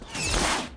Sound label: Open Shot